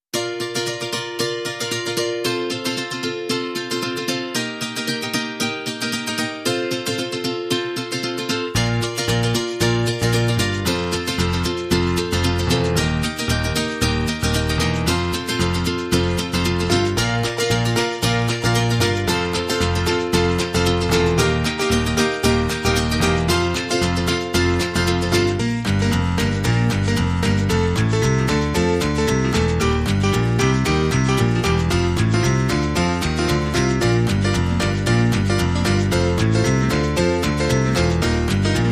Happy Days, No Drums

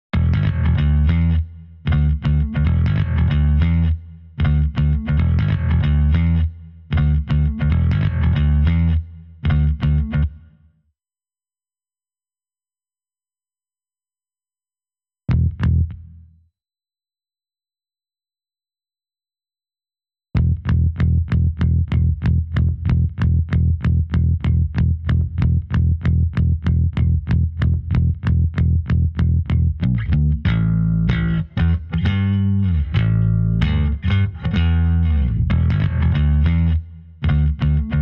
Hosana, Bass

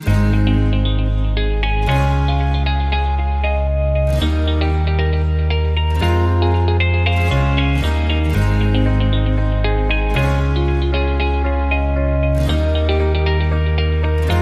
Inspiring Moments, No Drums

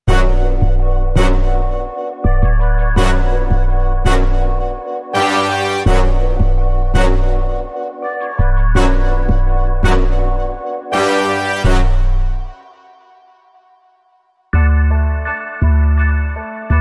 Inst No Drums

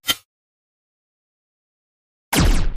Very Quick Noise Zap

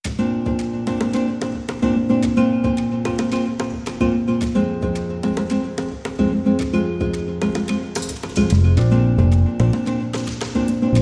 Latin Waves

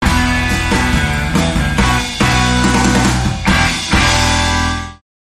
Shark Bite

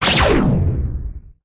Big Gritty Zap Blast

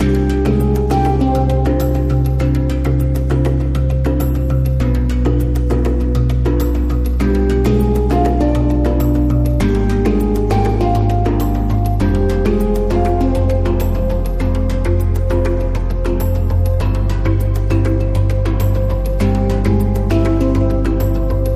Hand Drum

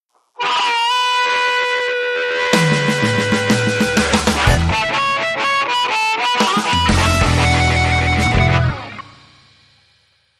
Gritty Club Blues, Sting

